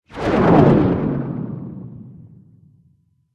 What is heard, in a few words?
mini dragon buff in